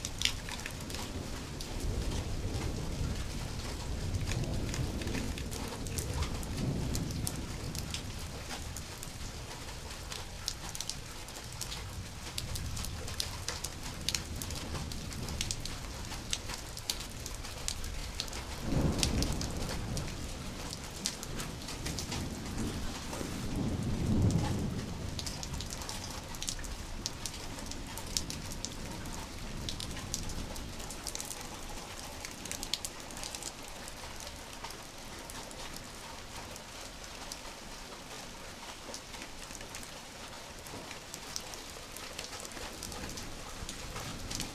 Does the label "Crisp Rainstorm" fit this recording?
Yes